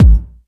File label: DRUM